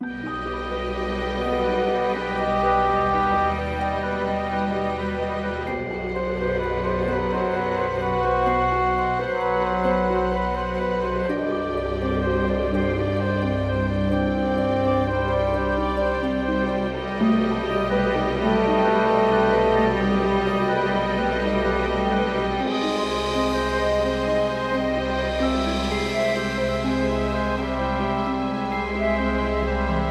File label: Background; widgets odyssey; psp